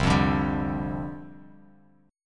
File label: C Suspended 2nd Suspended 4th C Bass Standard; Csus2sus4 strummed